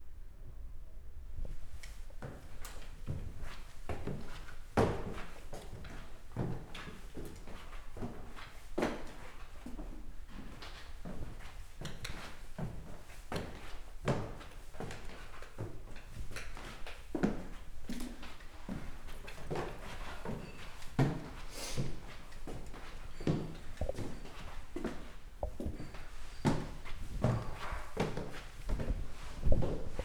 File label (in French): escalier; appartement